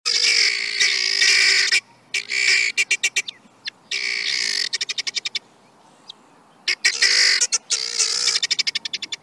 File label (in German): helmperlhuhn; haus und nutztiere erkennen